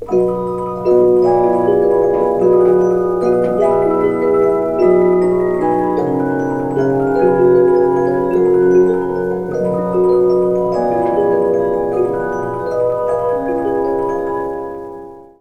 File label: Victorian Music Box; ringtones